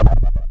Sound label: Plasma Rico, Plasma Rifle